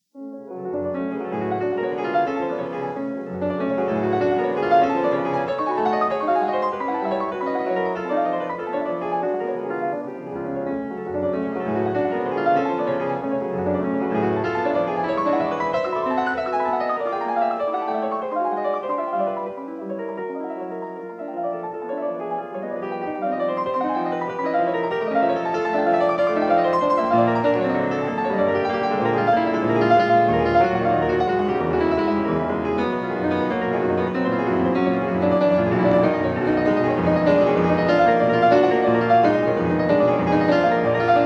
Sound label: Prelude in F sharp minor; F. Chopin; 24 Preludes, Op 28